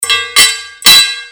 Sword Sound; FXHome; Fighting Sounds

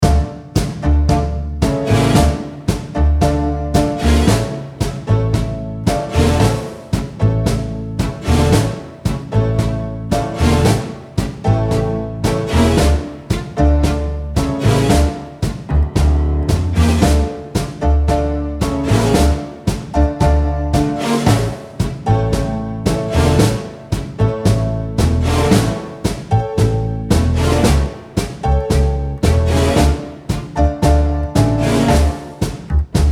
Tango Loop; Depassionated Tango Loop

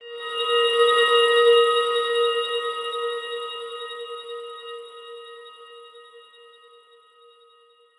Granular Abstract Ambient Element; Glitchedtones; Dreamstates

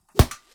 Punching Percussion, Stick Hit, raw; 99Sounds

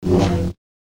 Saber; FXHome

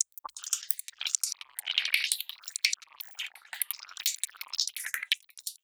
Loop, 70 bpm, Circuitbend

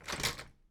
door, Foley; Varazuvi; California Foley

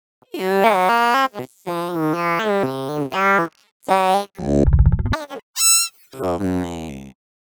Vox, Circuitbend; 99Sounds; Mangling Audio

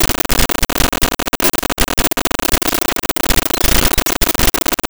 Rhythm

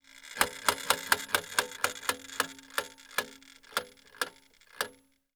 Old Metal Clock; 99Sounds; The Warehouse

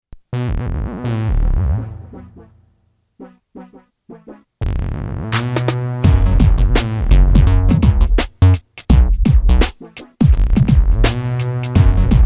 Messy Beat

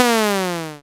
lose; Kenney's Sound Pack; Retro Sounds 2

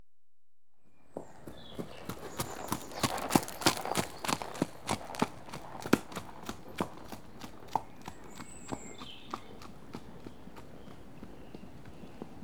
One Unshod Horse Passes At Trot O; Horses & Dogs; BBC Sound Effects Library

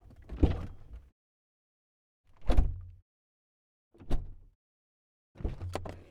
Dodge Challenger Hellcat Doors Various Interior; MKH8040; 2015; Pole Position

